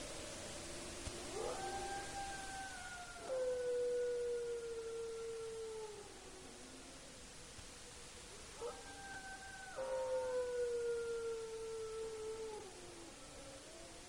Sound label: Dogs Howling; Denali Kennels; alaska acoustic highlights